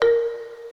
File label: Ding A#4; 转生成为了只有乙女游戏破灭Flag的邪恶大小姐